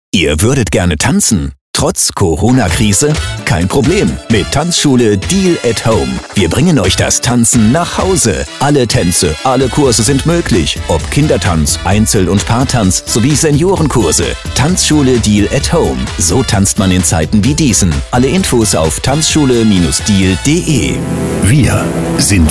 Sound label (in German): klingelton